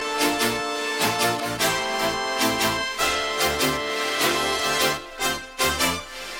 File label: Melody